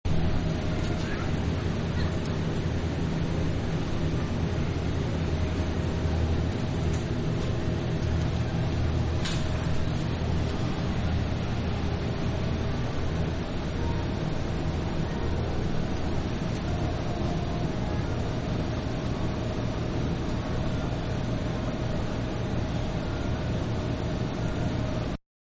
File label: Supermarket Big Freezer Section; Stores and Malls; Background; Manhattan Production Music